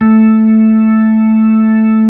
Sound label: B-3